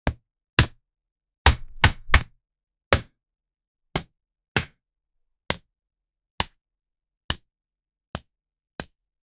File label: slaps on the iron; material